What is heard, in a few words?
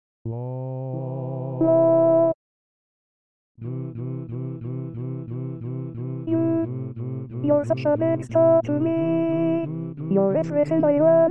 Alto